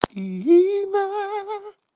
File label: audio message